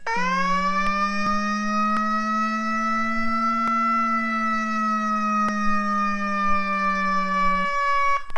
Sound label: SIMULATED MECHANICAL and Howler